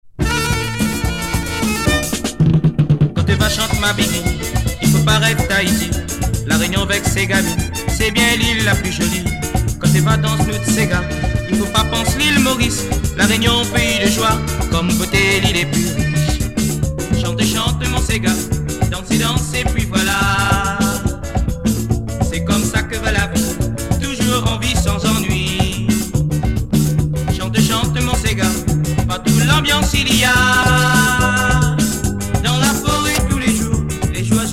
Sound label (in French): lambiance séga